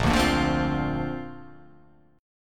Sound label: A sharp Major Add 9th